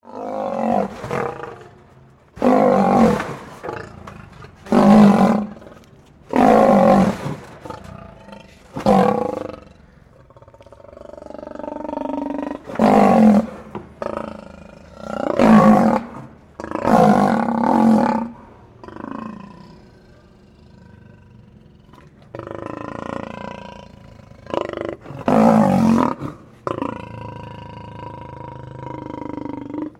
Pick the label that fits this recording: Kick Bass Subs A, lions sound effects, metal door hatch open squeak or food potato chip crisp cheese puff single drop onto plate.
lions sound effects